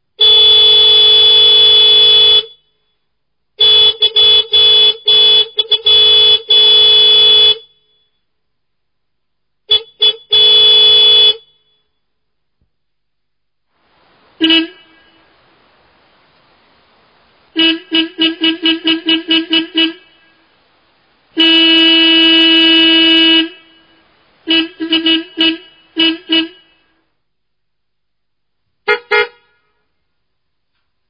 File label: Car Horn (Annoying); trasporti; auto